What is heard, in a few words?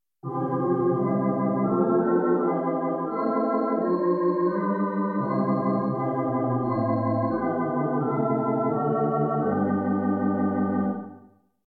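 Tibia 8 tremolo, organ; Tibia8+4; Paramount Middletown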